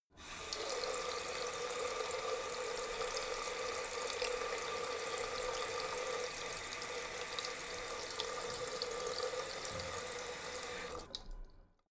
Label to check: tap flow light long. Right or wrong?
Right